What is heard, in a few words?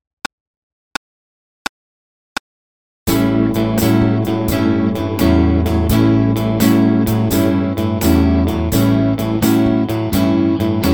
Swing; Swing Station